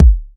Bassdrums; Vengeance Samples

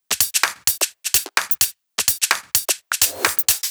Drumloops 128BPM

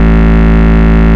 Bass Root A; Vengeance Samples; Vengeance Ultimate Bass

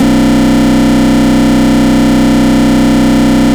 Root B, Ultimate Bass; Vengeance Samples

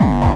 BD Distortion, Bassdrums; Vengeance Samples

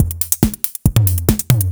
Breakbeat; Vengeance Samples; VEC2 Loops

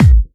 Hard Kick; Vengeance Samples

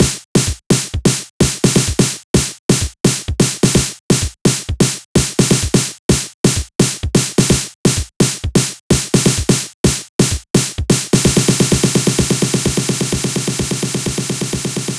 128BPM Style, House Electro Fills; 8Bars; Vengeance Samples

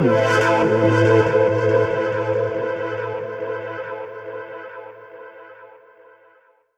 Tonal FX A

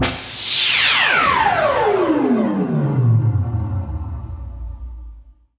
Downlifter

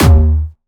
Jumpstyle Kick E2; Bassdrums; Jumpstyle Multis; vengeance essential club sounds-3; VEC3 Bassdrums

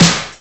Snares & Claps; Vengeance Samples

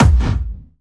Attack Noize, Jumpstyle Kick; Bassdrums; Jumpstyle Multis; vengeance essential club sounds-3; VEC3 Bassdrums

